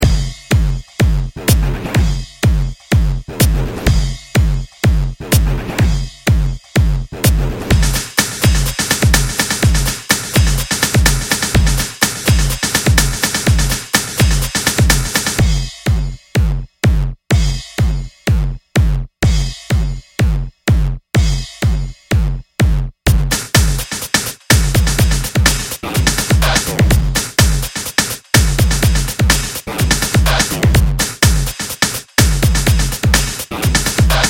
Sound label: Beaterz; Sound Factory Loops; InZane Beats